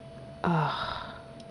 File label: ugh; Nokama